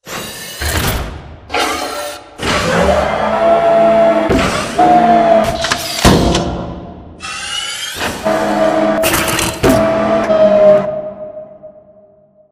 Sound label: Assembly Wall Arm Close; Boomtown; Titanfall 2